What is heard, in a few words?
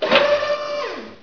Panel up